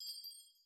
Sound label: chimes jangle; angband